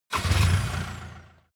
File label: pickup start engine